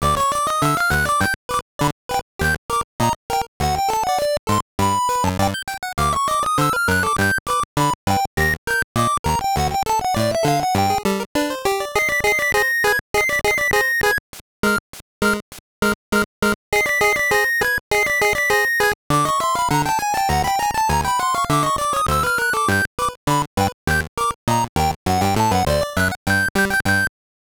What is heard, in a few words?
BGM; Track Meet; GB